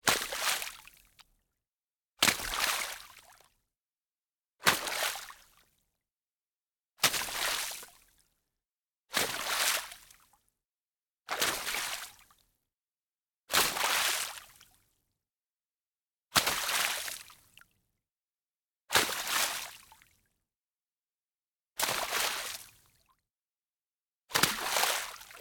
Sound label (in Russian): prizhki po luzhe